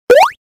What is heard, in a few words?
gain health; Mario1-1